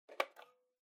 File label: Calculator (Battery Remove)